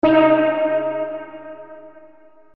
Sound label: crystal; meepo; chorus